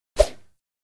Badminton Whoosh

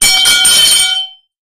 Impact Metal Pipe

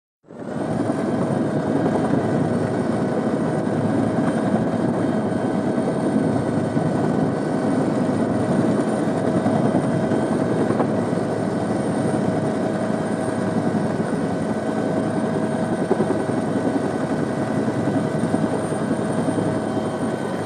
UH1 Int Hover Flight, uh-1 iroquois

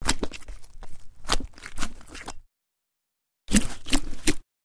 Disemboweling, gore; gore stabs & eat

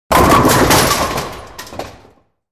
Bowling Ball Hits

